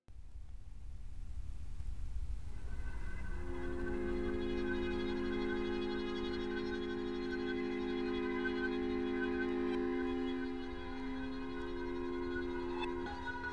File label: celesta bass pluie; radio